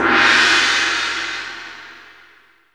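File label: orchestra gong; Greytsounds VOL-1; ORCH PERC 2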